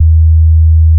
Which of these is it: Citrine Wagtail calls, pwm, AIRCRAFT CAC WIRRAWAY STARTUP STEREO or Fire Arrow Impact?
pwm